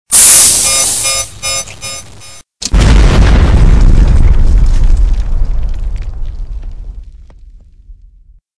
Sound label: explosion finale; Predator Mod